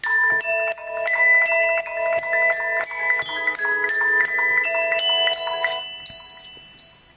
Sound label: fog on the water ringtone; samsung galaxy s3